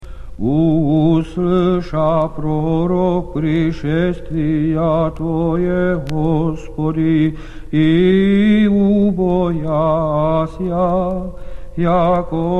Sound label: Great Canon Irmos Ode 4; Papp Irmologion